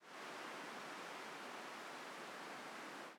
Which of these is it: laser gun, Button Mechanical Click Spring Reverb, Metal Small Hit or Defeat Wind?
Defeat Wind